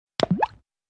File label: water plop